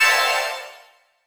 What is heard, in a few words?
near Win; netent